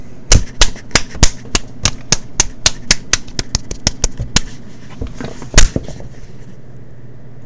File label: slapping attack